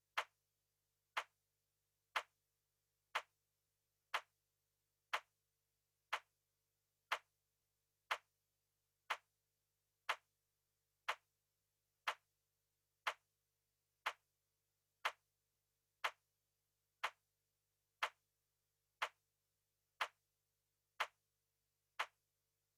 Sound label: 121 BPM C# Min Clap, Pop; Melodies; Loop Stems; Arena